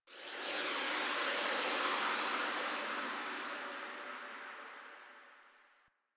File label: Landscape Wind Atmosphere Anomaly, Ambience; Warframe; Venus Open World; One Offs